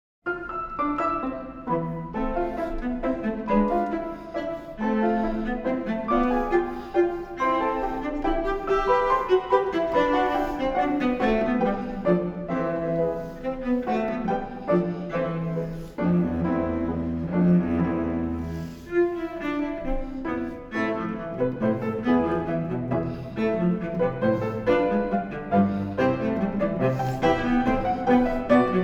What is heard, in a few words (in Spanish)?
sonata de cello en mi menor, Allegreto quasi menuett; Recital AECC; J.Brahms